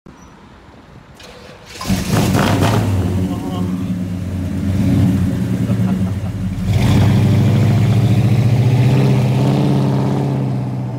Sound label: Buick; اگزوز